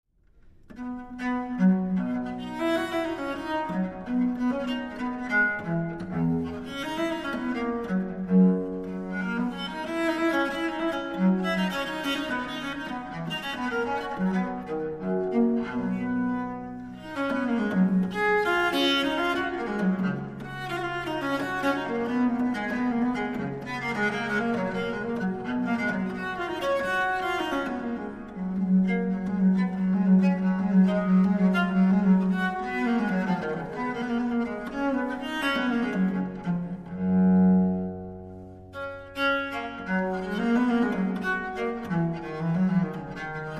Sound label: Courante